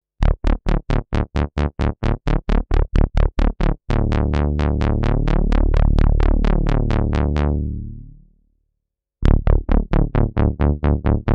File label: Bass Preset